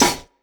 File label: Snare Hat Combo, drums; One Shots; Bangky